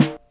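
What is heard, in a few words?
snare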